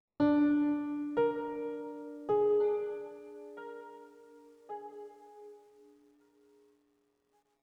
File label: One shot Piano